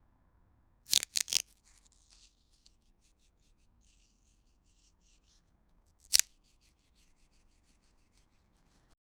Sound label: carrot cutting